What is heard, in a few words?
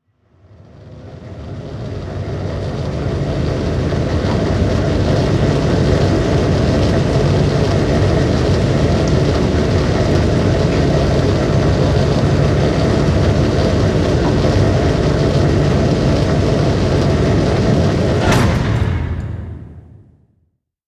Ball Hall Bridge Extend